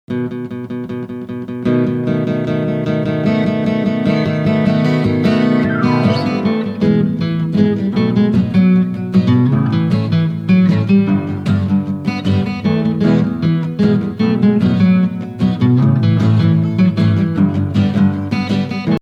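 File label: chlorophyll folk instrumental; garden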